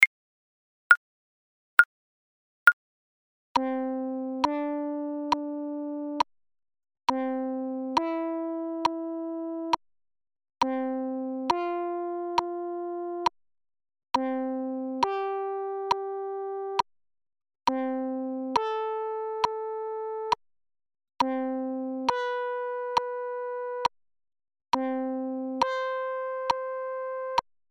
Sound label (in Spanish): Entonacion Intervalos Escala Mayor Saltos